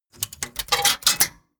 mechanics small movement

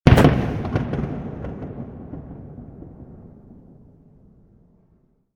explosion

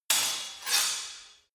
sword hit scrape fast sword

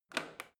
washing machine snap button press out

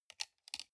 sunglasses plastic side piece fold

